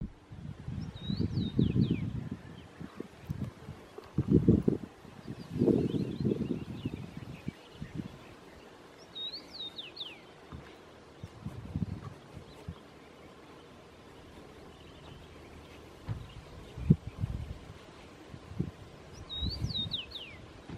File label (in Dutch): Roodmus Carpodacus erythrinus